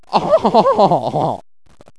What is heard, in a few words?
huhuha